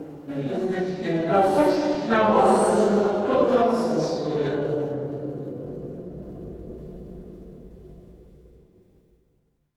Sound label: crowd murmur